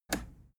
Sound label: plastic button scratch push enable